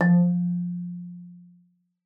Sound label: MAR F3, MARIMBA; Xsample VOL-4